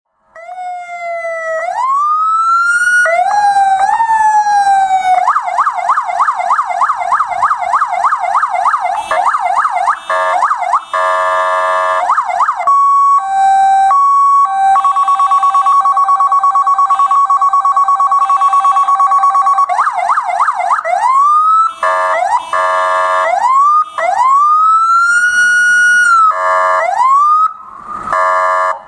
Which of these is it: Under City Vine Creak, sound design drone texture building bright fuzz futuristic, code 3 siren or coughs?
code 3 siren